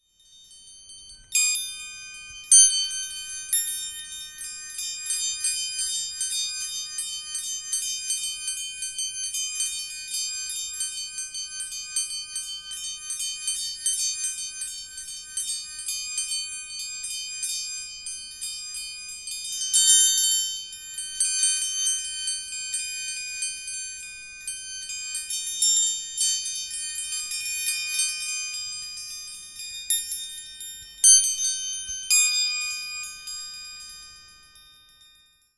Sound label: doorbell; doorbell steinbock